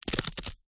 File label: household plaster band aid x2 rip apart perforated